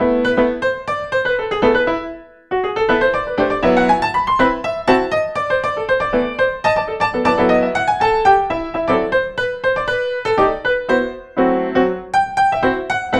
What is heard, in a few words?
Piano VST Art Vista